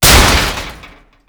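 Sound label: debris metal medium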